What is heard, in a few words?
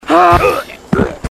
death burp